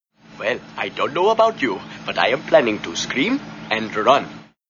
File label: scream and run